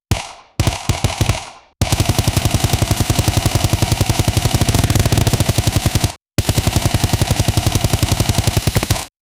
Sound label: powerful volleys of black, history